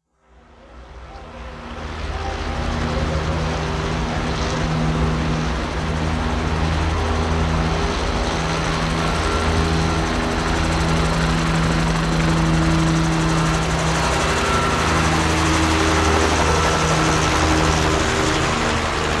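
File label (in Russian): вертолет летает над головой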